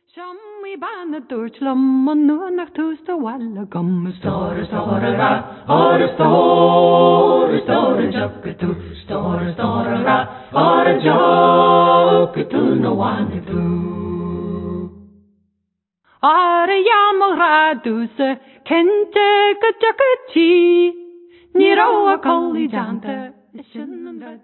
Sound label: lofi